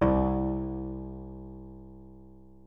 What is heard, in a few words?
koto